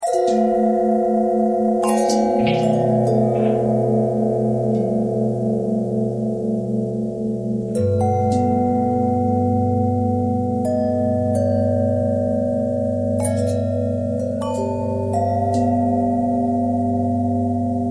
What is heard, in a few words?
long bell bell twist